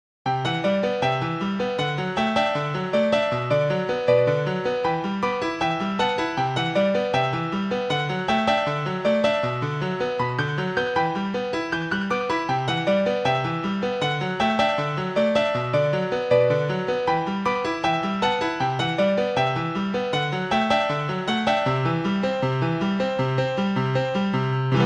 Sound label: piano